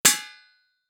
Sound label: Impact Bowl Metal Hit By Large Metal Pipe; Impacts